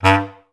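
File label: staccato G1; Best Service; Peter Siedlaczek's Advanced Orchestra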